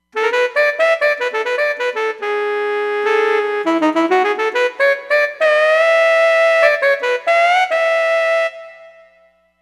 Alto Sax, Roland Fantom XR; FXM Alto Sax

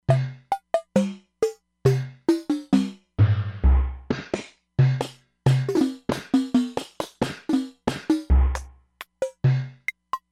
Repique; Factory patches

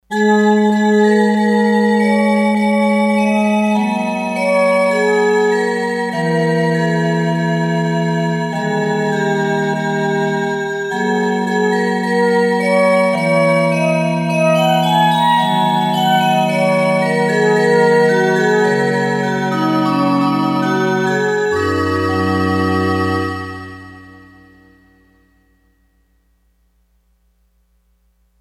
Chatter Glasses; Factory Patches

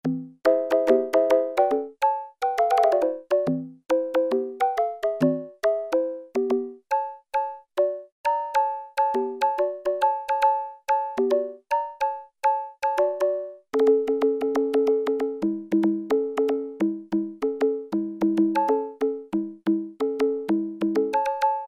CONGA, Oberheim OB-8; Factory Patches

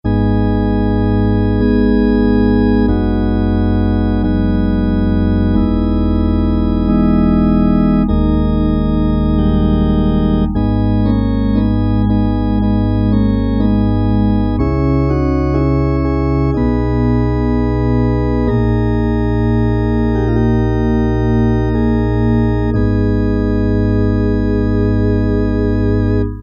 PIPES

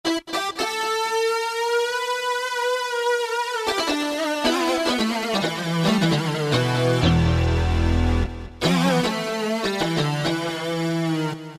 Griitttarr, Roland D-50; Factory preset demos

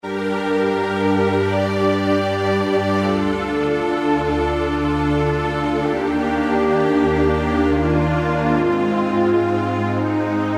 Yamaha TG33; ST-Full

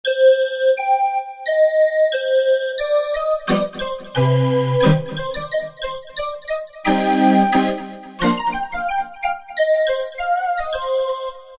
Living Calliope, Roland D-50; Factory preset demos

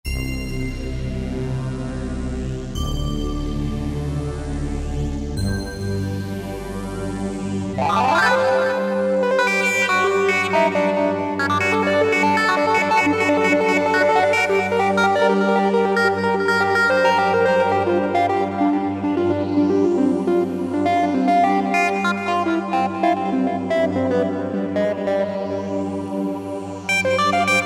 Dark Quack; Generalmusic S2R

